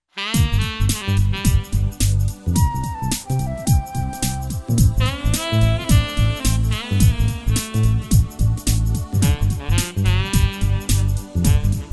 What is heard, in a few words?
smooth jazzy sax